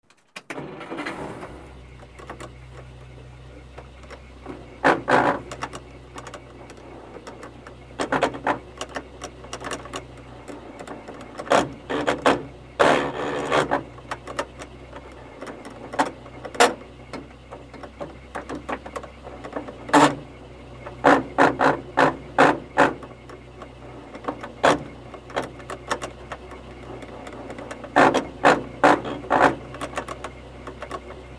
Dodge Power Wagon Fire Truck, Interior Pov, Gear Grinds.